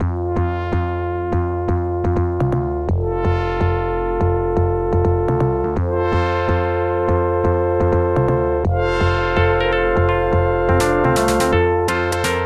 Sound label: FM upbeat